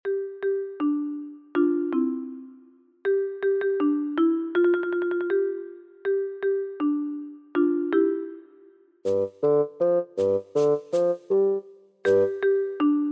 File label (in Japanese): ほのぼのとした曲